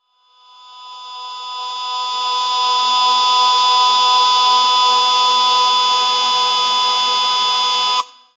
rayo electrico sonido; arcane; rayo electrico sonido com

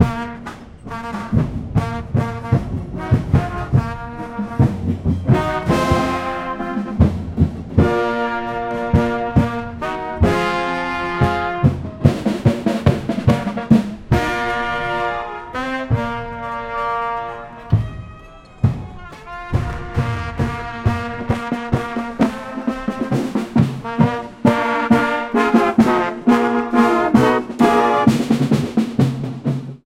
marcha militar; mezclada; military

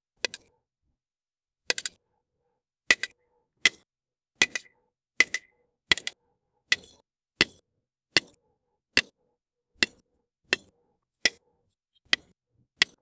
pasos recorriendo las cab, blacksmith

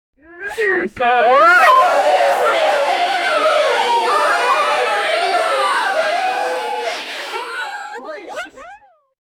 sonidos de fiesta

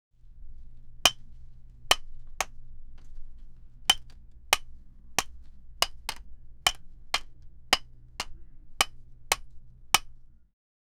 sonido de golpe; physical

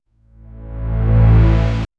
efecto de sonido synthwave

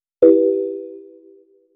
tono suave con un cash, financial

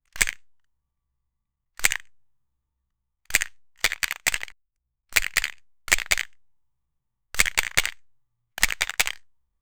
disparo de arma de jugete, toy

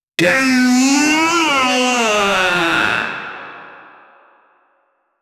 rugido, epic

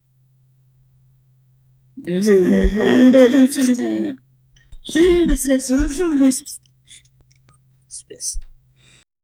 sorpresa, surprise

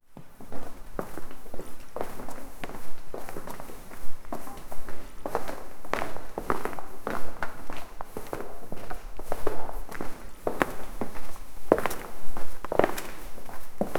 pasos lentos sobre un sue, church

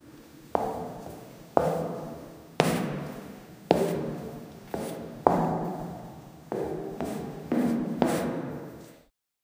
pasos metlicos en un pasi, hallway

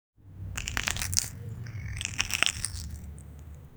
crujido grave de puerta, antique

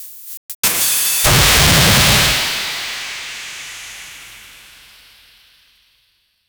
jumpscare super agudo e

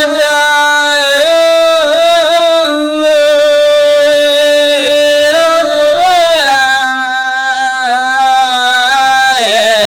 cancion de india bollywoo; bollywood

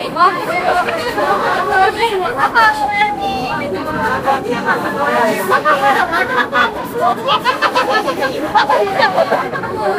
gente histrica chillando, passenger

